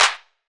clap single hit D key, Royality free hand clap sample tuned to the D note. Loudest frequency: 3008Hz; percussion; single hits one shots